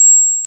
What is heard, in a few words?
Syn Dr, Overloaded Synthetic Drums; style kits